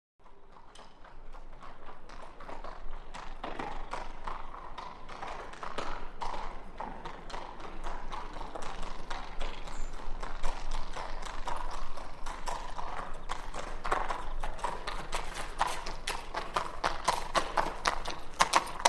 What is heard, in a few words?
Single Horse Walks By On Cobbl; Historical; Hollywood Edge Historical Series; War Horses Armies & Weapons